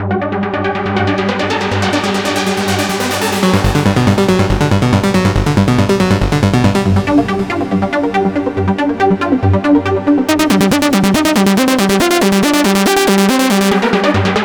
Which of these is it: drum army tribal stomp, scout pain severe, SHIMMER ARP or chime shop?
SHIMMER ARP